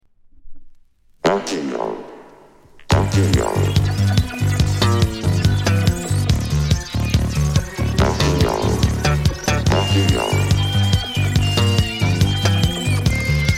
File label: techno